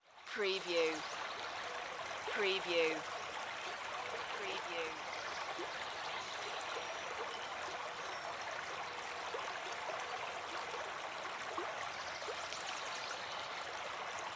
River Forest

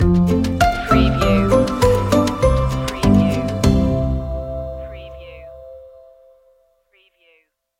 ukulele weekend stinger

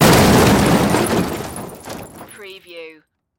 Crash Metal Car Drop

